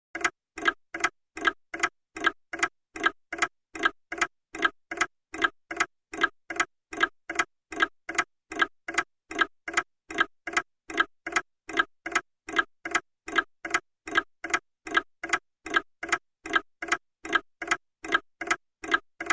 Mantle Clock Ticks; mantel

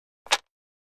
Cards Deck Slam; casino games card games

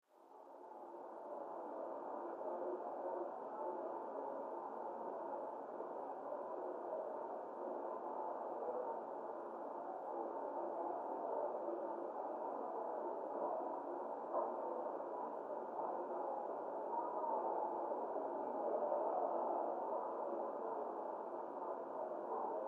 Talk Small Crowd, murmurs

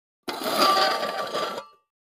Coffee Can Scrape On; barrels buckets & cans

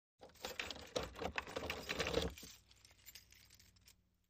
Unlocking Door Keys, keys

